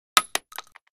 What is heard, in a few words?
12cal shell wood, shotgun; bullets